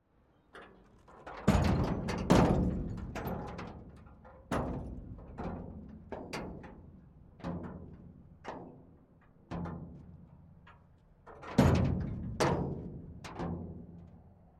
ZIL Garbage Truck Exterior Foley Cargo Single Hits; ZIL 494250; FLYSOUND